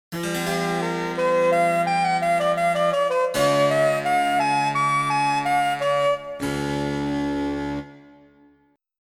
Cadenza F to D

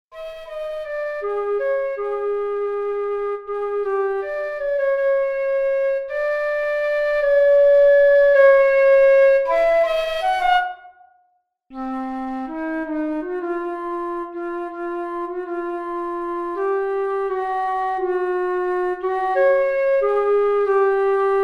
flute solo 12th root of phi; flute-compare-tunings

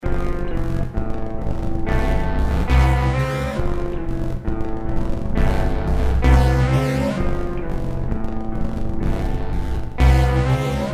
12 and harmonic; simultaneous tunings

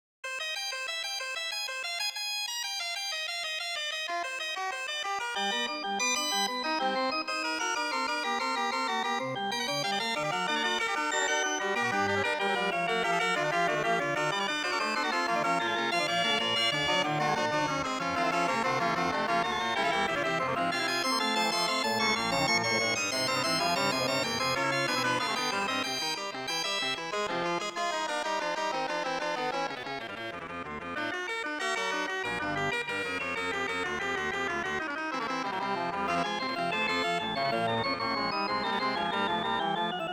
Rag Fugue; Cuckoo

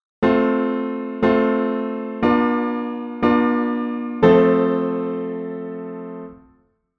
plagal cadence IV 16; 16 edo theory; 16-ET

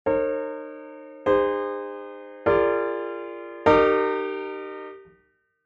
17 cadences, 17-ET

